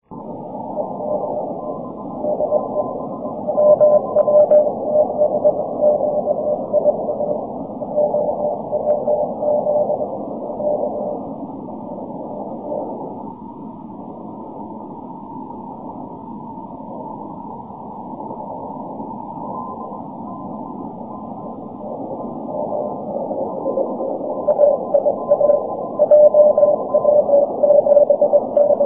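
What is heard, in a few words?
6m continuous wave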